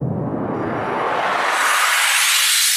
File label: FX Uplifter